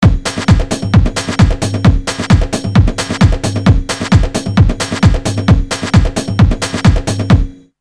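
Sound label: new drums; drumz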